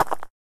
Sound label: gravel, footsteps